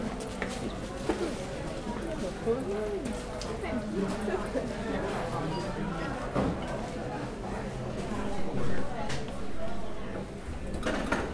élelmiszerüzlet előtt, velence; olaszorszag 2014

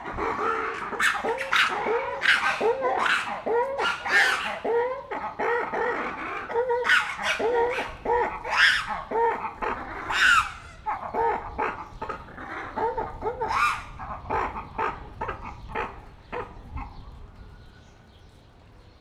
egymassal veszekednek szel, vari; debreceni zoo 2012

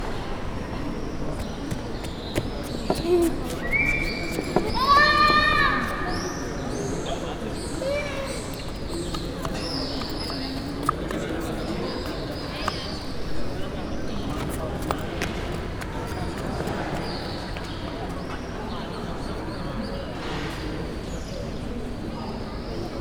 szokokut korul futkoso gyerekek

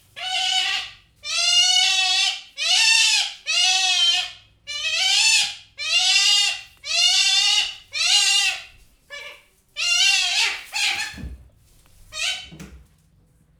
sivalkodó állat, ázsiai kiskarmú vidra; jászberényi zoo 2015

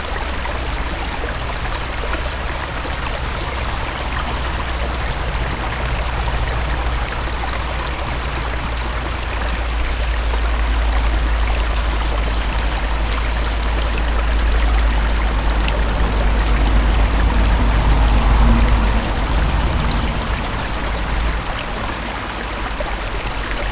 csacsogó, balra két autó, patak; visegrad

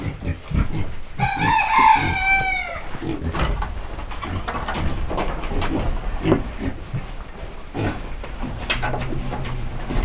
esznek a disznók, birka, kakas, istálló; állathangok; paraszt udvar

